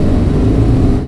first shift down, car; vehicles; garrysmod; sincar

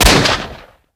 deagle shoot, weapons; garrysmod

